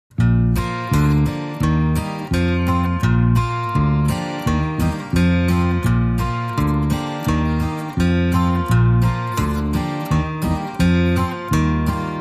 No Melody No Drums Reduced Arrangement

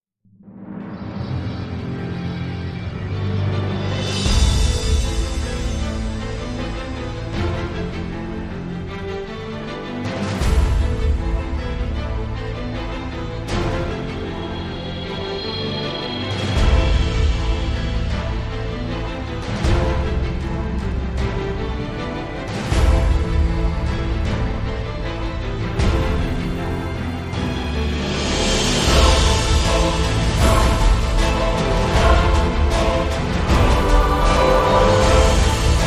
Requiem No Drums

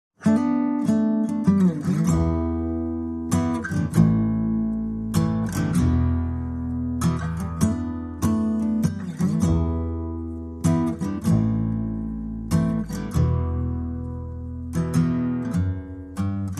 Blasmusik Guitar Bass and Tom Only

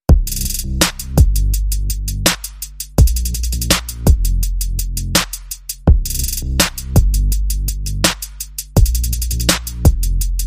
Bass and Drum

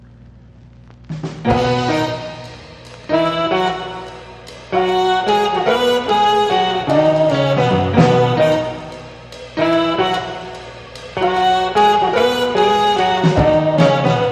Instrumental

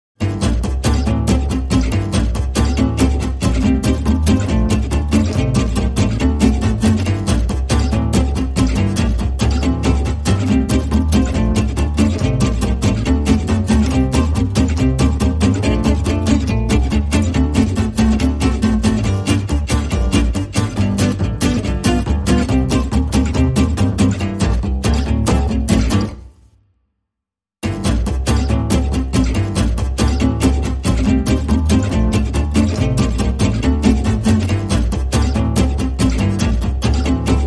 Bass and Guitars

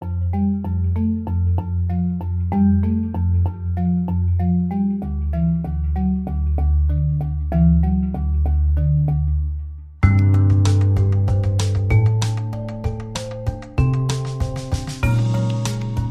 Cool Marimba

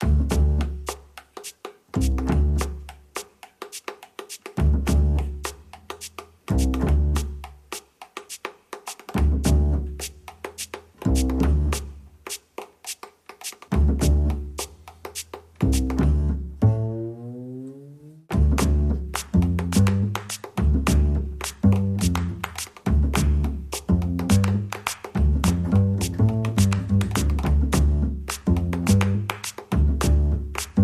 Drums and Bass Only

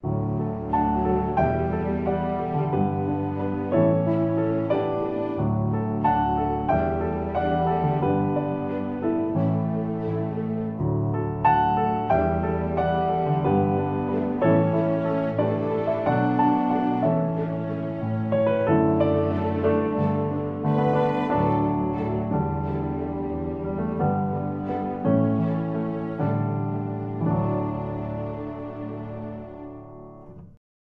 With Strings

